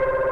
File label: perc high n dirty; putney; freshmeshdemo